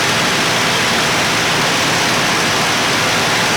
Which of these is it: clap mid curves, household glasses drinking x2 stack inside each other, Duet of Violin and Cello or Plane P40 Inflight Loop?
Plane P40 Inflight Loop